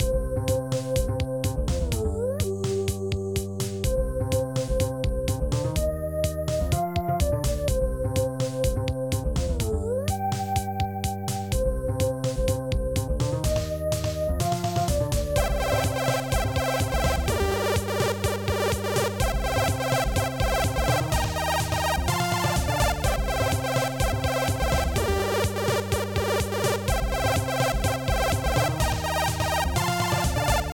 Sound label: menu; epiphany